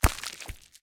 step, spider; Minecraft; Votive World

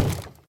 wood, zombie; mob; Minecraft; Conquest Default

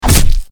crit, player attack; Minecraft; Votive World

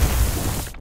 fire impact; Minecraft; Votive Content; wows

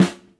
snare, note; Minecraft; Votive World

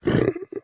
say, pig; mob; Minecraft; Votive World

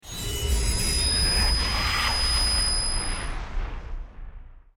stare, endermen; mob; Minecraft; Votive World

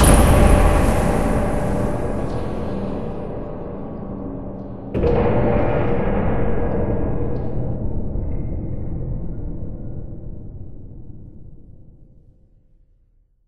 spawn, wither; mob; Minecraft; Votive World

